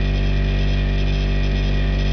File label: buzzer refrigerator; svencoop; sence; mistake coop